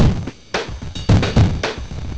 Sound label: 3 drums